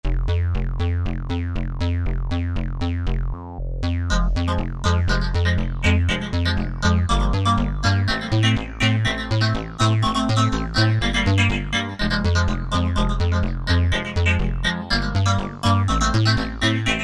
Euro Trance, Korg TR-Rack Combi; Euro Trance Time

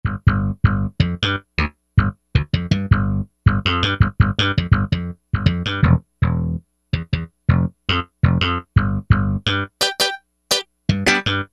Slap-Pop Bass, E-mu Proteus-1; Presets

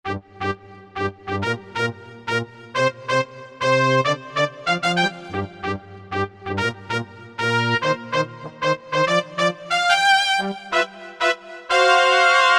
Tight Brass, Korg MSC-11S Brass

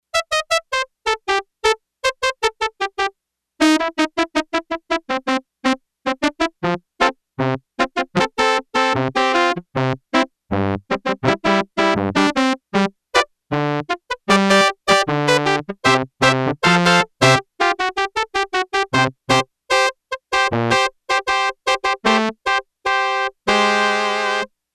Trumpet B, Yamaha TX802; Factory Patches; Voices

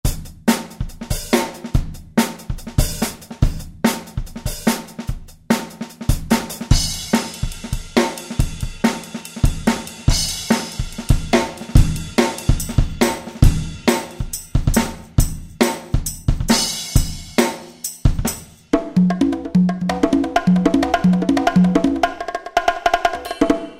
Studio KIT; Roland SRX-03 Studio SRX

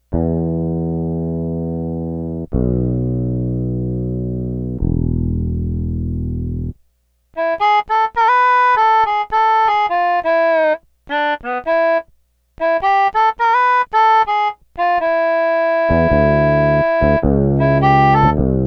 FRETLESS BASS KEYBOARD HARMONICA

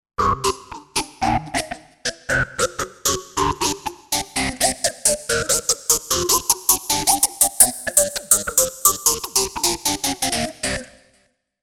Disto Stab, Roland Fantom XR

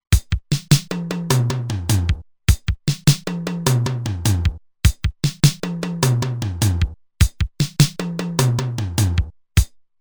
Fill In 4-4, Roland TR-505; Factory Rhythms

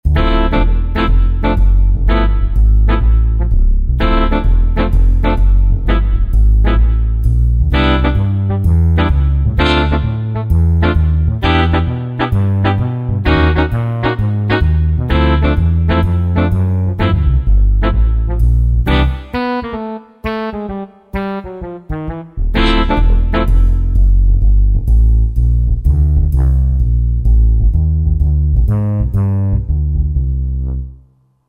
Roland JV-2080; Blue Notes

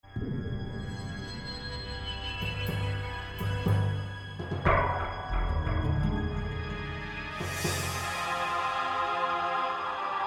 Epic Passage

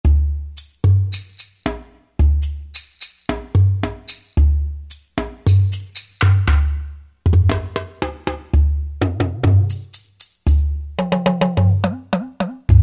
Perc; Factory Preset examples

